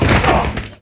fall; openffa; blade

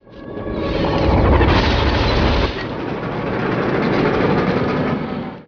starship; spawngirlsounds

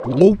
gurp; monkey

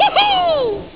jump; kennyrim

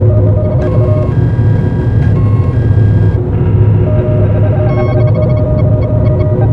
amb gener

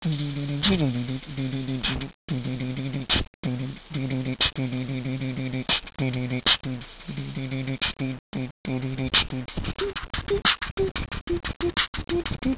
music; baseq2

